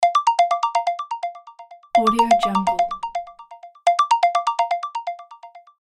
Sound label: morning ringtone